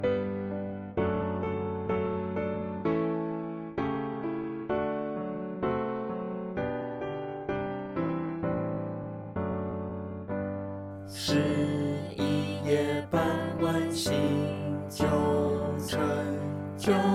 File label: vocal; Hymns; Chinese